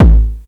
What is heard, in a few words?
techno 2, bassdrum; Ueberschall; Techno Trance Essentials; DRUMS; BD TECHNO2